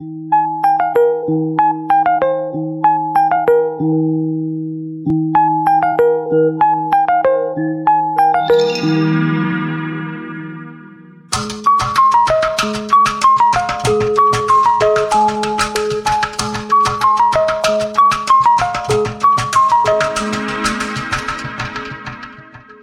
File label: Oppo A2X, ringtone